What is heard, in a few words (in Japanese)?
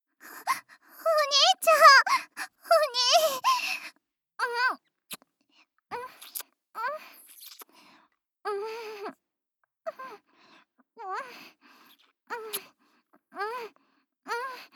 エッチボイスのみ